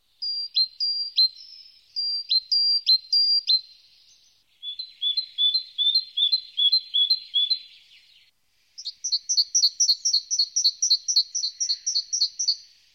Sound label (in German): die kohlmeise stimme; So klingt die Kohlmeise